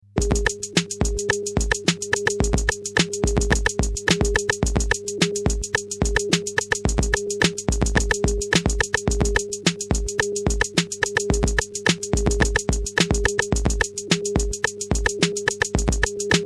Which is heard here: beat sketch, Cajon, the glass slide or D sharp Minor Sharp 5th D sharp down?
beat sketch